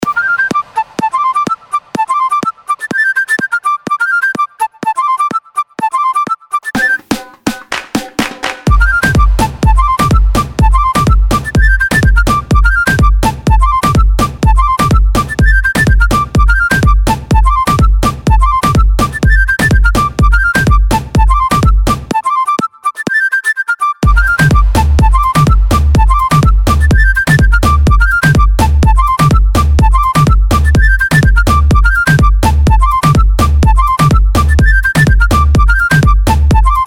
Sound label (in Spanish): la flauta